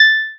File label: ding